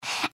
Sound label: breath; pony; Twilight Sparkle